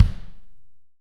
AMB KICK; ST AMB KICK

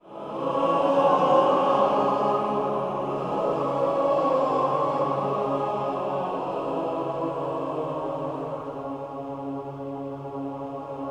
ROUND END, Voices; ROUNDS

